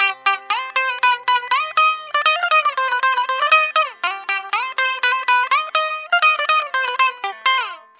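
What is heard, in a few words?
electric bouzouki; EL.BOU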